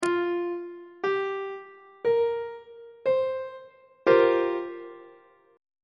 c dominant 7th suspended 4th 1st inversion on treble clef